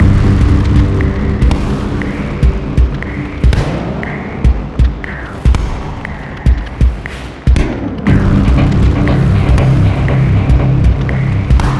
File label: Fight; Cube Life Island Survival; wii u